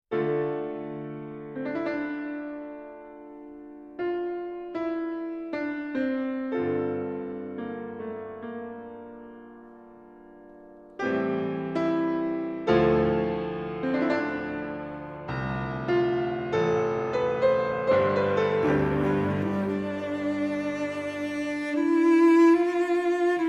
Cello Sonata in D Minor, Lent, sostenuto e molto risoluto; L.135 I. Prologue